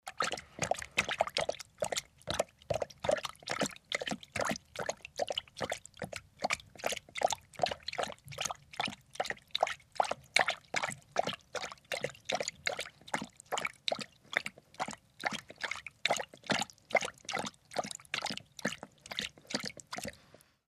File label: Dog Lapping Water; mvmt